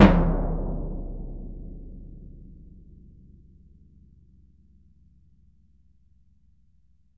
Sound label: BS DRM F; ILIO; Synclavier Percussion 2; World & Orchestral